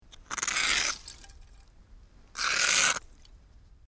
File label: Ice Scraped Off Winds